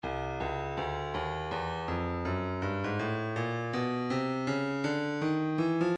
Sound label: breakbeat 081 bpm; Neck D; Stylus Breakbeat Midi Files